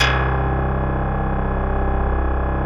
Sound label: BASSES; Wizoo - Powered Wave